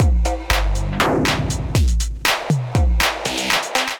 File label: Delicate Chaos Kit, drums; Ableton; Factory Packs; Skitter and Step